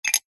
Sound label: foley sea shell set down hard surface